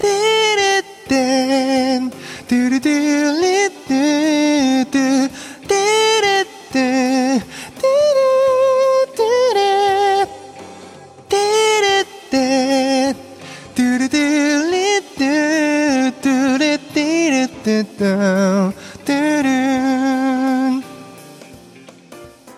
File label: rev cathedral